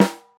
dope snare drum sound g key, steel snare drum sound tuned to the G note; snare drum samples